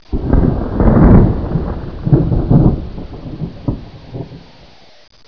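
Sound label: thunder far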